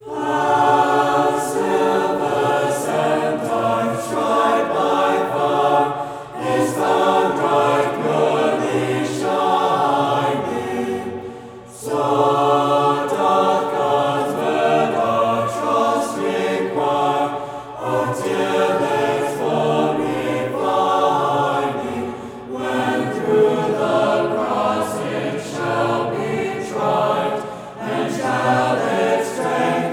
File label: O Lord Look Down from Heaven Behold, Kammerchor; Hassler